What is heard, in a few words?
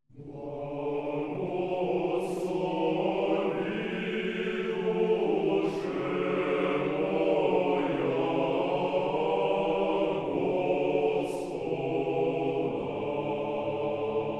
Ancient Russian Chant; Early Medieval; Praise The Lord, Oh My Soul